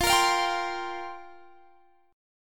F Suspended 2nd Suspended 4th, down, Guitar, Open G